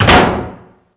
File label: Door Metal Small Closed; Max Payne